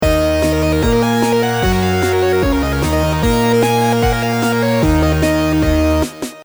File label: intro music in eb minor